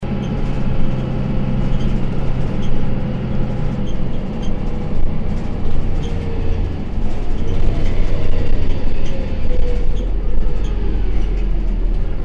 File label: bus arrival inside